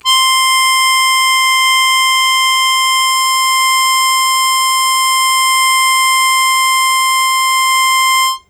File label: Loud Attack Sustain C3, Harmonica; Zero G - Harmonica